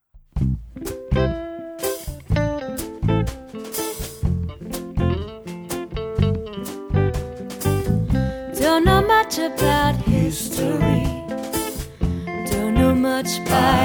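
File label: Quintet (voice, guitar + backing vocals, guitar, bass, drums)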